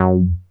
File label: NASTY BASS